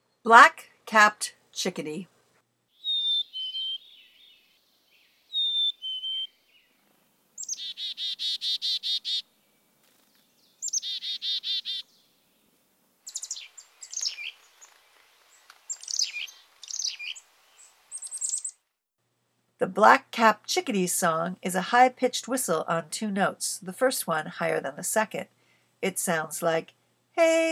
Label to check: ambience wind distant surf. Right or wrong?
Wrong